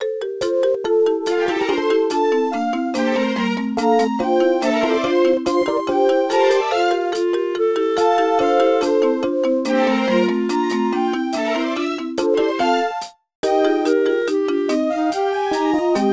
Music Jungle; Sesame Street; Elmo's A-to-Zoo Adventure; NDS

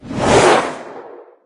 Cast Jump

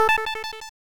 1-up Balloon; Donkey Kong Country 2; SNES

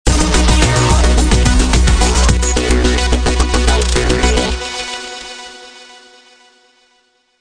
shield - success; heavy metal f.a.k.k. 2

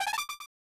Menu Sound Effect; final fight 2 snes

